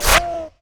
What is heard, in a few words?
basic attack; LoL; graves